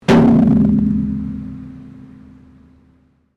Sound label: METAL IMPACT BONG; Materials